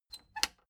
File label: plastic button latch squeak click disable slow